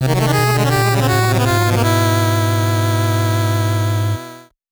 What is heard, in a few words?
gameover; panicpumpkin